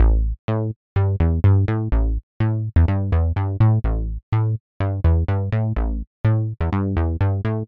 Retro Synth w Sub

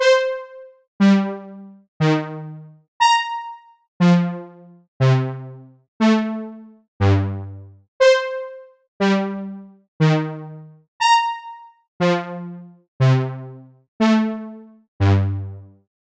Syn Brs Stab Dry, synth brass stab; Newfangled Audio

